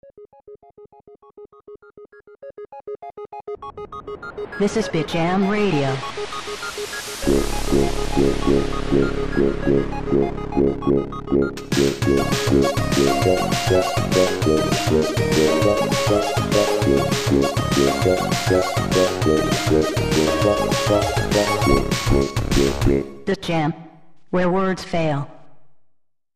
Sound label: Jingle; Bitjam